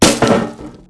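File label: grass; Mafie 1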